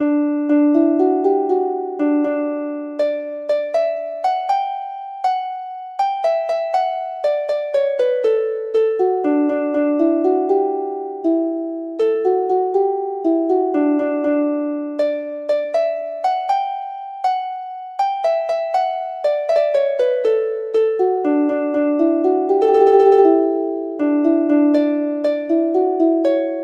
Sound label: harp